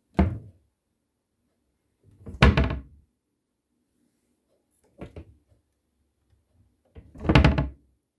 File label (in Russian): derevjannyj dvustvorchatyj shkaf fasad metr na metr